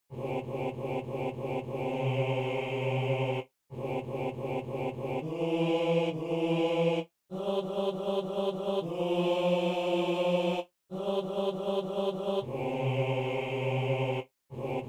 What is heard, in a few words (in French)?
hommes